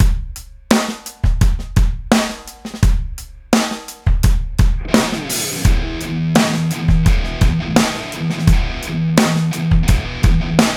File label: drum